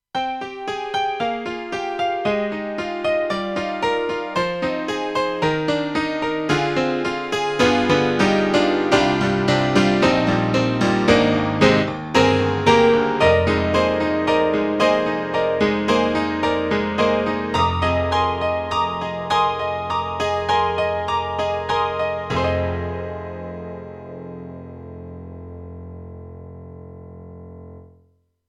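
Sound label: Honky Tonk, piano; KORG 05RW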